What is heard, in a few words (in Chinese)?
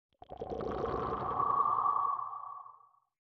潮涌核心短声